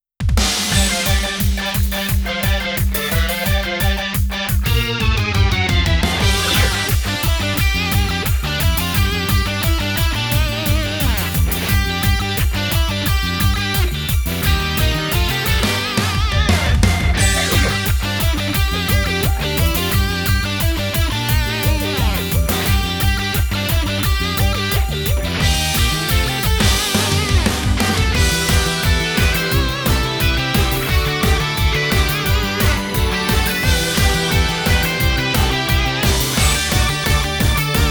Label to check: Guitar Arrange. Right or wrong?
Right